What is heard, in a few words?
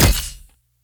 water cannon